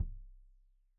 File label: Kik Stereo; Deeply Sampled Drums; SMDrum